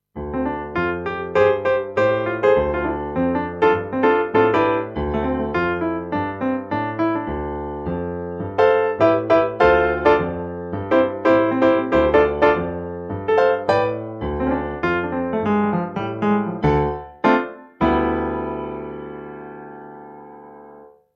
Grand Piano 3 ohne Para EQ

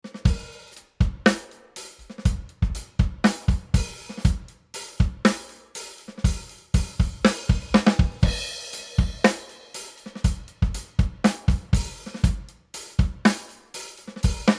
Compressor Drums komprimierter Raum; SSL G Series

